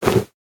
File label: armor unequip leather; mcl armor